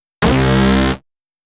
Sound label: wrong; overte; lastAvatarStandingTrivia; wrong local